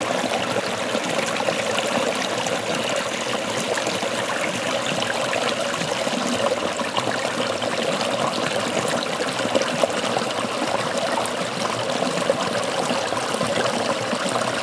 water stream medium

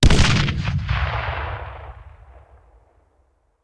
Grenade Hand; Sound Ideas; Guns

